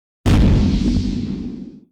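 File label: Point Damage, bullet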